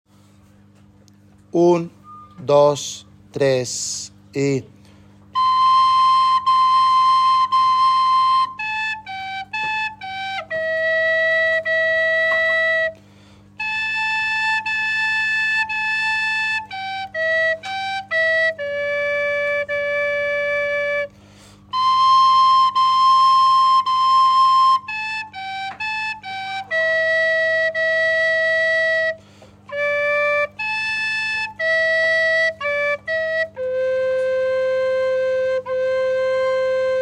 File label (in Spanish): Flauta